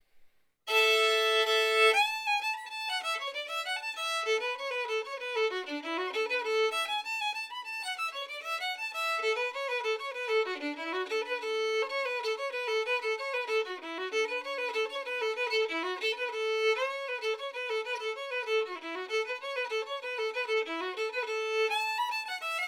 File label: fiddle; Cripple creek